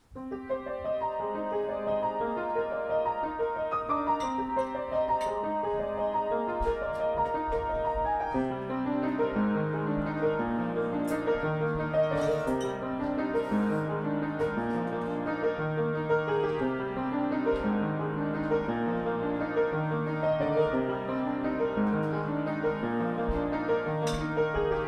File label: Before Tuning